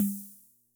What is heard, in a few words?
Tom Cymbal; classic machines; concertmate